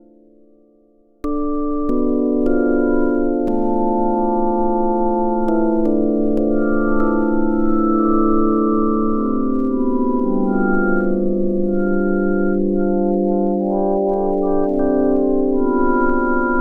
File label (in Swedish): testar nm polys